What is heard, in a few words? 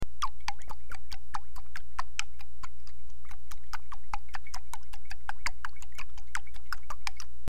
water Sloshing In Small Bottle